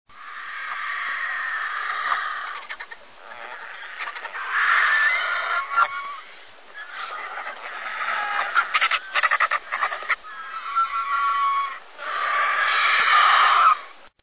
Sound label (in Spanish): canto buitre leonado; aves campo